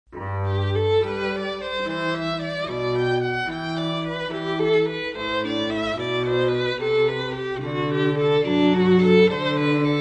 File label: String Duo; Bach; Jesu Joy